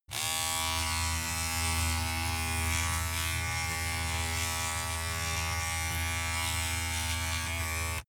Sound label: razor on load pov short